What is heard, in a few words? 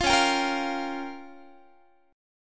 Db 6th Flat 5th Db down Guitar; Db6b5; strummed; 20,21,22,20,21,x